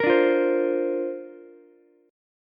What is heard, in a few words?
C 7th Suspended 2nd Suspended 4th C down Ukulele, chord; C7sus2sus4 strummed